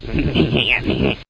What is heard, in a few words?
heh heh heh yeah heh heh, laugh; beavis